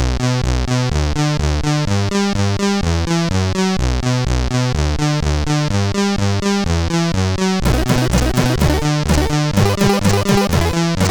Hard Crash, CSG8580; hvsc

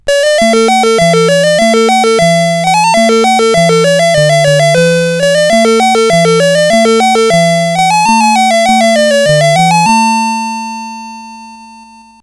sid CSG8580R5, GAMES; Alice in Wonderland

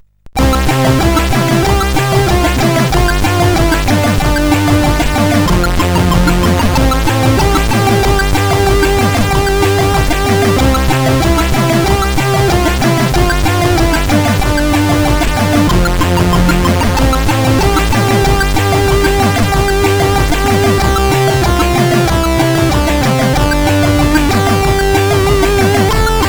Old Music, CSG8580R5

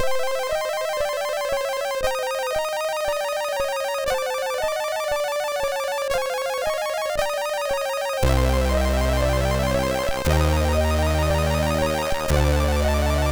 Spooky, sid MOS6581R2

